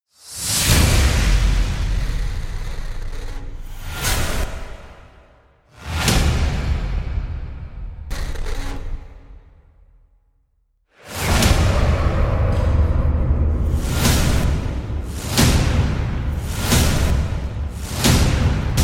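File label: trailer backends percussion; ascension fear perc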